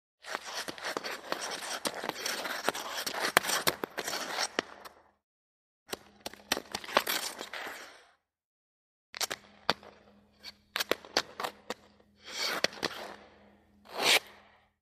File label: Hockey Single Skater, ice skating